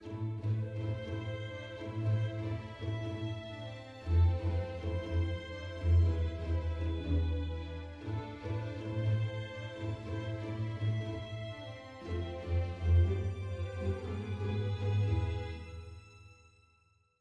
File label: heroic soft; Quivr; PS4 Windows gamerip 2016